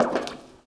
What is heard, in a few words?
bleacher step; Golden Crayon Sounds